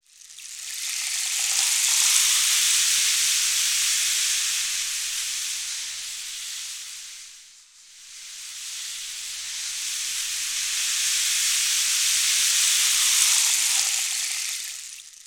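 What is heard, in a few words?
rain stick; Spectrasonics; Heart of Africa 1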